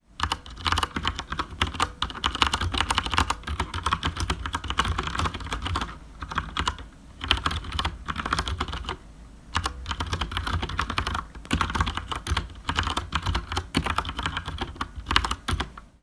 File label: CHERRY MX BROWN